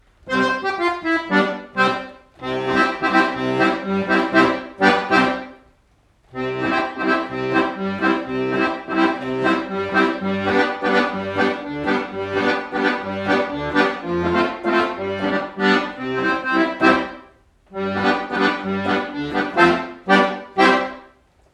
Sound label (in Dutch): karaoke versie